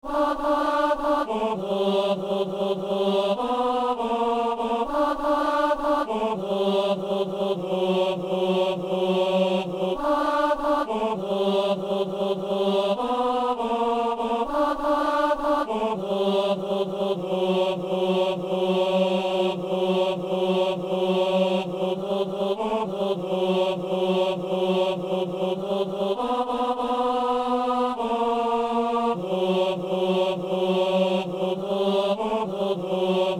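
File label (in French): ténor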